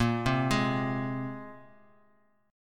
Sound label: A sharp 7th Suspended 2nd down; arpeggiated; JGuitar